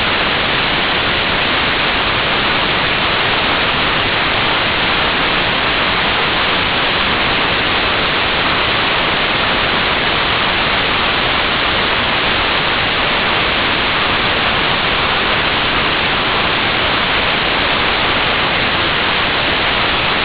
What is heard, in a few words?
cw aurora; audioexample -11 dB; CWP is a CW-compatible digital mode